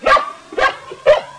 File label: Puppy barking sound